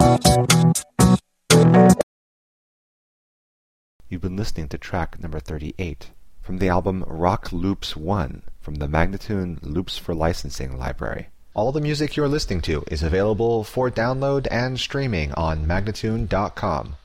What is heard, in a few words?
120 B spoken, Rock Loops; Loops For Licensing